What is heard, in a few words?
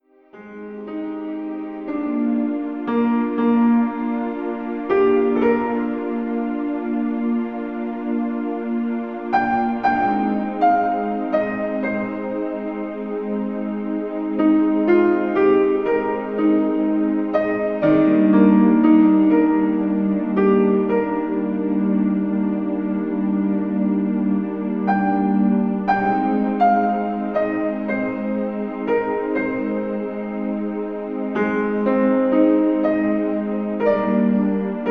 Piano Dreams